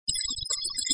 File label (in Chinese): 闪; 创维移动+vika 音乐与声效